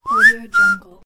human whistle

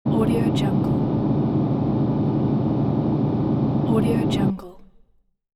rumble space mid

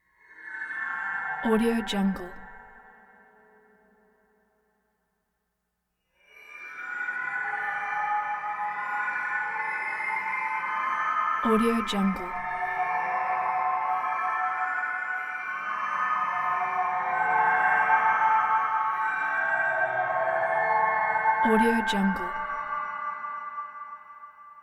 magic tones harmonic tonal swells atonal

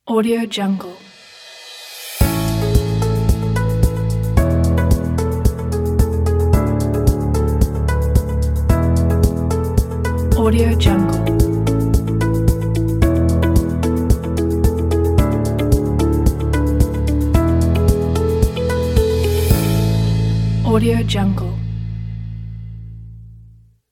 happy ident

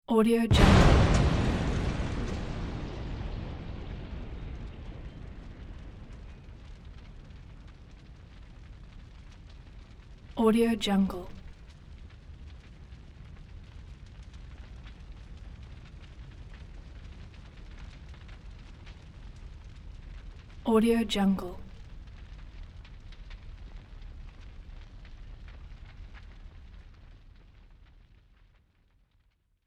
explosion

